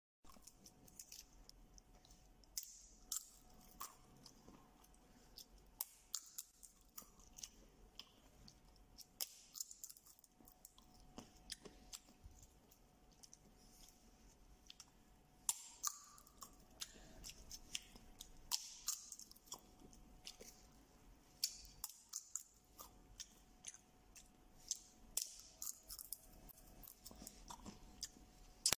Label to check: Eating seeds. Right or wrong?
Right